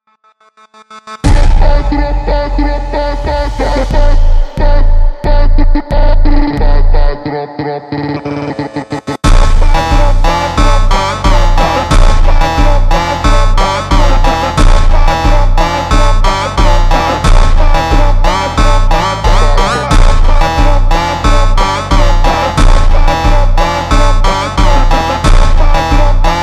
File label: Kung Fu Funk super Slowed